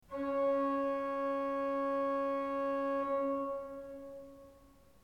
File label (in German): ton, orgel